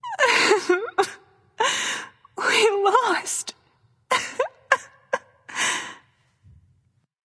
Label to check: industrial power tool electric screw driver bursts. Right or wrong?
Wrong